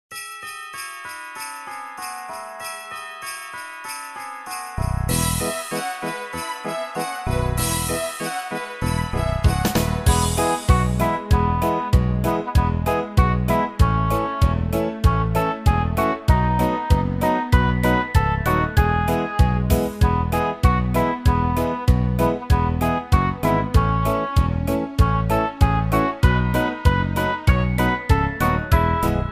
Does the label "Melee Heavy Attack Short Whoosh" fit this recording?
No